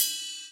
ride bell

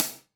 hihat

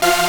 synth; osu

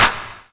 clap wave

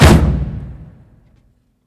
drum hit finish

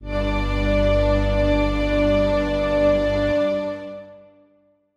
Strings

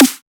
snare

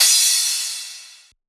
crash

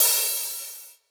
cymbal weak

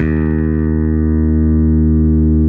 bass

